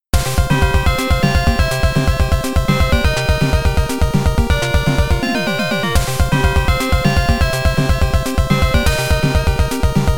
volcano, synthesizer; fdsynthesizer by kamisaka lab

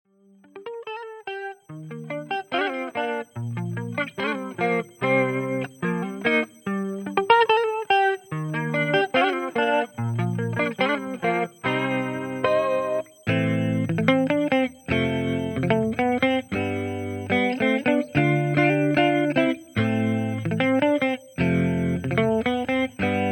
riff

